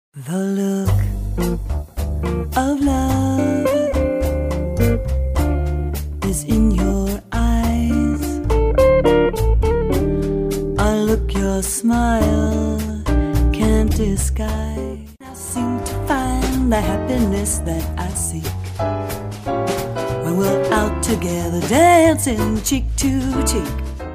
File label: Jazz audio samples